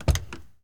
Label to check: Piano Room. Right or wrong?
Wrong